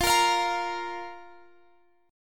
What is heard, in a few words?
Bb 5th F